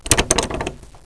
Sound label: latch locked